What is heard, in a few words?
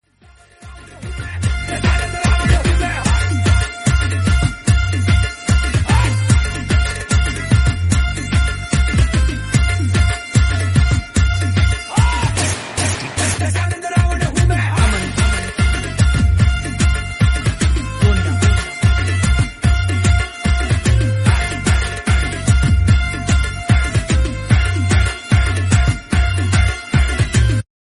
Instrumental ringtone